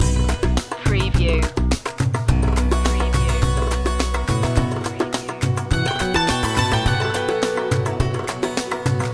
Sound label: middle eastern oasis loop